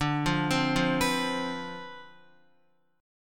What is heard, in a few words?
D Minor 13th D, Guitar; Dm13 arpeggiated; JGuitar